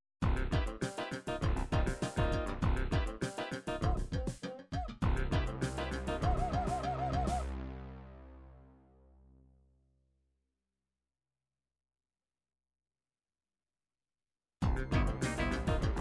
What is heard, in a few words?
dance rap, midi